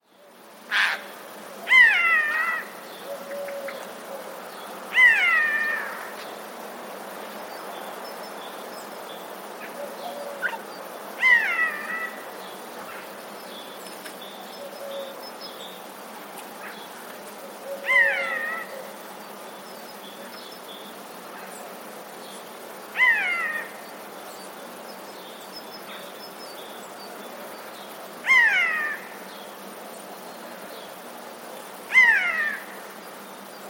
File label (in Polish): sojka